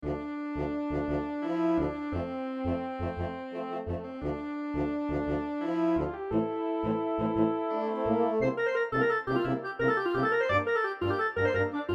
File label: No Perc